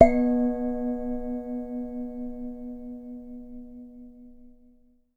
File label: BURMESE G, BURMESE GONG; Voice Crystal - Steve Reid Definitive Percussion